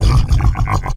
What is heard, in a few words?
Bowser Laugh; MSC